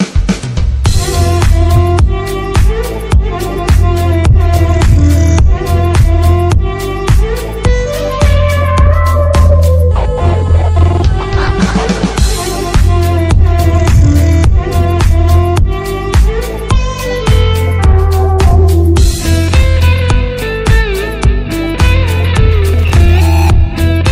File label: Arabic ringtone